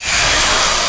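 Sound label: player force lightning begin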